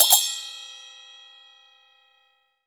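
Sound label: SMALL CYMBAL, CHINESE PERC; Spectrasonics; Heart of Asia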